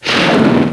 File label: rocket; quake2; coop